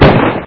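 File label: short sound of gunshot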